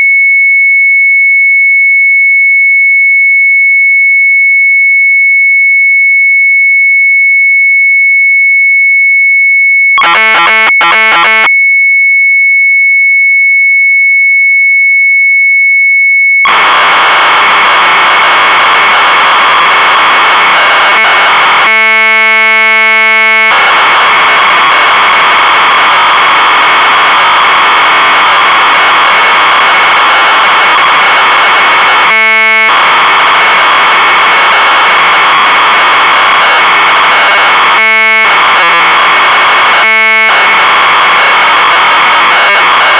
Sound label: MZ800 Tape, sharp; XFER; retromelsarchive